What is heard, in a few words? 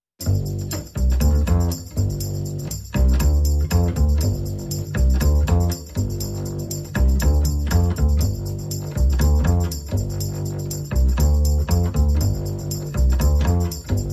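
percussion and bass only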